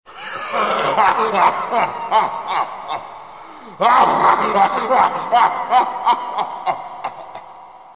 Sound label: haha